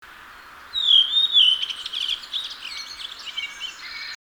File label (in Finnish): Turili, Iso-Huopalahti. Kolme alkutavua: voimakkaasti laskeva, nouseva, laskeva.; Espoon - Helsingin raja-alueilta alkukesästä 2008; Linnut